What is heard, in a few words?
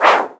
Dunk Swish of Air